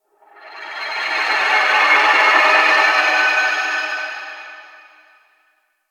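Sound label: Drifting Texture; Warframe; Ambience; Entrati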